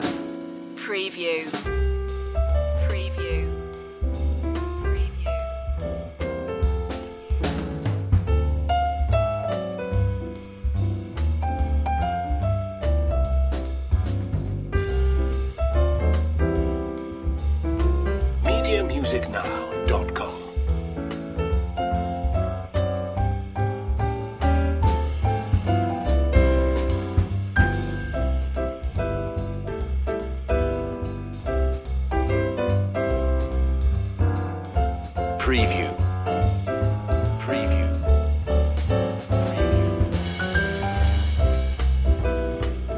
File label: jingle bells jazz trio